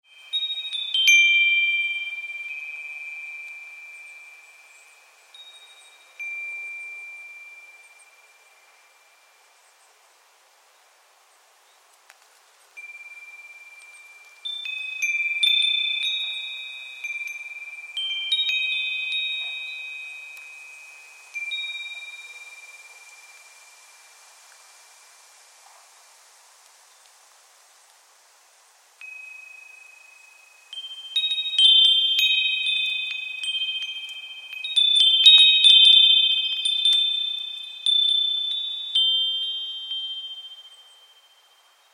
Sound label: wind chimes ipswich cemetery